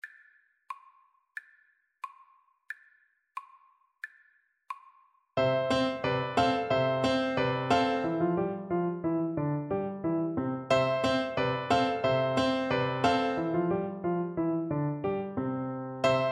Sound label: musette Recorder; bach